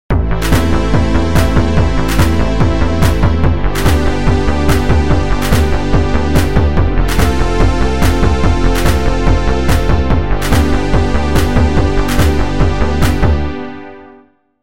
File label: growl bass test